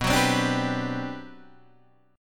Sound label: G Major 11th B